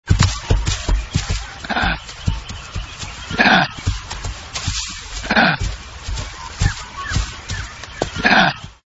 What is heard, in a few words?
kitten; animals